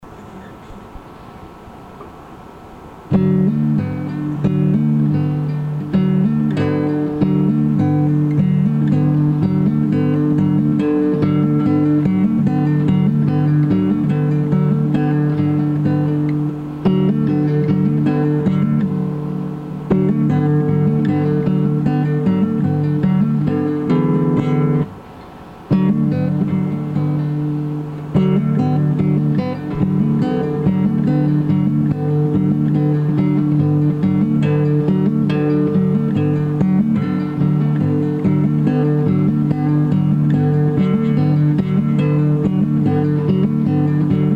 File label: Guitar